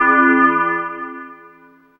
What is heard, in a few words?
Synth Bells; Dream Zone